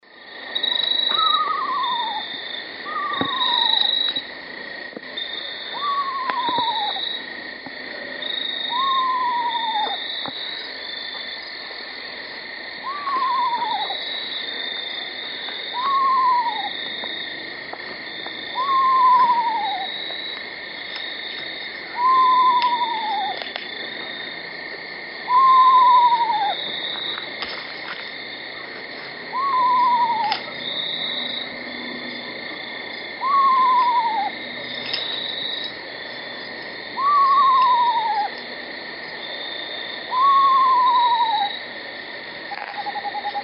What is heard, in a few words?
eastern screech owl tremolo whinny; lg price